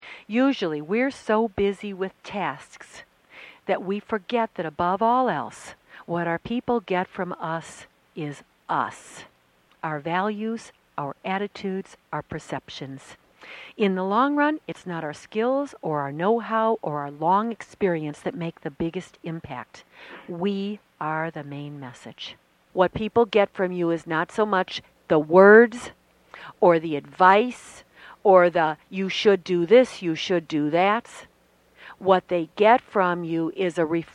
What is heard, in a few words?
love lead keys